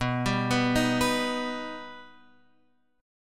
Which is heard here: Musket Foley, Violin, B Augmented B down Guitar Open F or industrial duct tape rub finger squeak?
B Augmented B down Guitar Open F